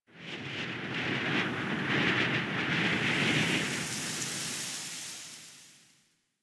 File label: Wind Gust Small, Ambience Plains; Warframe; Eidolon Plains; One Offs